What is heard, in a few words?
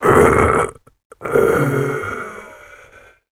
death, voice; garrysmod